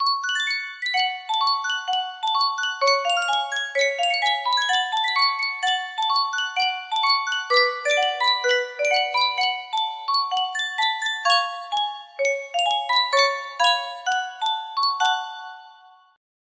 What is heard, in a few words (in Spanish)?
yunsheng caja de musica